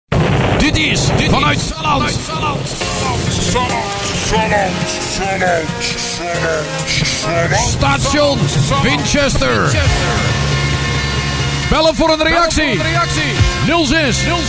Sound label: Jingle; Winchester; Audio Fragmenten; Arkan Radio 2